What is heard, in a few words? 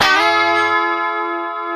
D#4 D funk chord, guitars; Spectrasonics; Hans Zimmer Guitars VOL-1; 90's Sample CDs